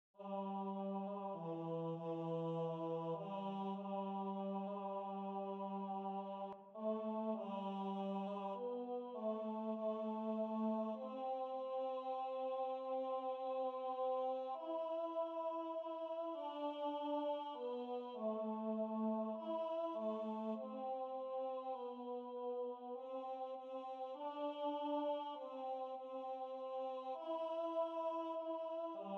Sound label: choir